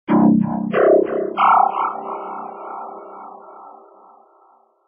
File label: effect sound